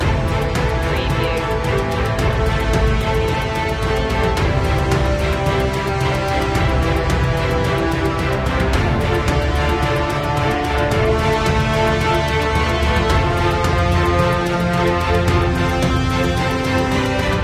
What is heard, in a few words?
victory in the battle loop; media music now